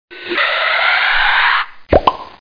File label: balloons